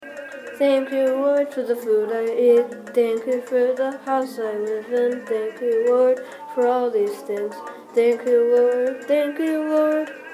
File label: rap